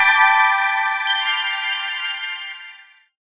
SFX; Wario Land Shake It; Kirakira 3

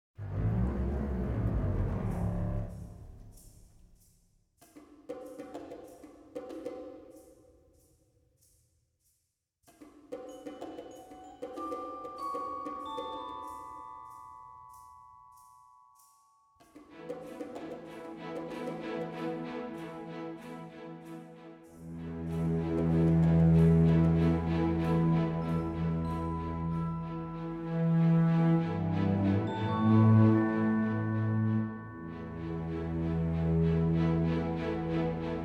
World Music

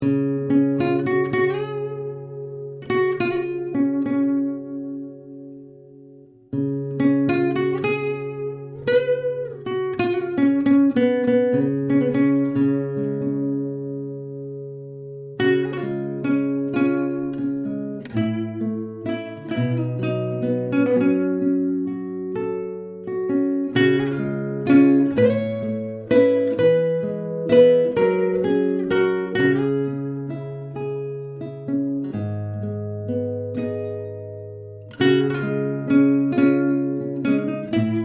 Bard Instrumental Loop

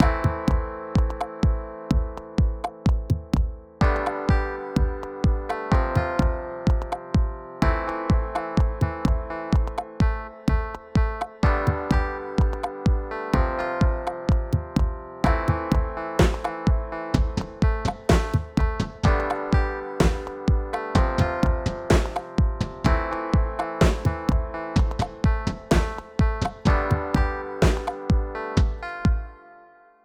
fisher battle

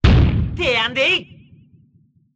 appear; goemon; Mystical Ninja Starring Goemon

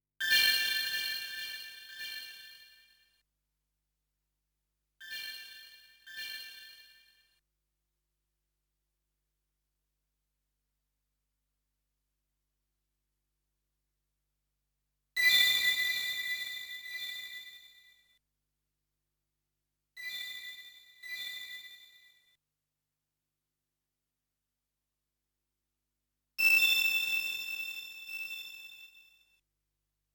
Stage Ambient Music; snk arcade sound digital collection vol. 18; Yuki Stage 1

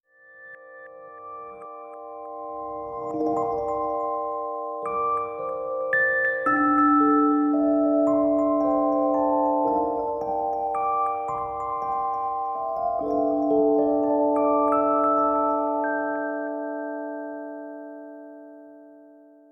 Instrumental; fire emblem fates if english

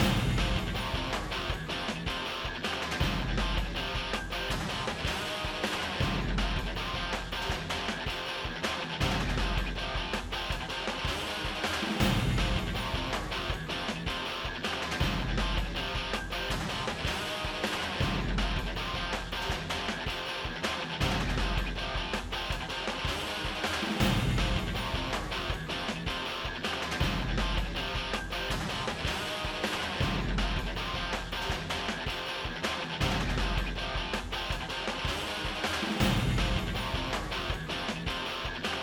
Combat Loop 160BPM

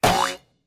Wood Hit; bad piggies; android; mobile; windows

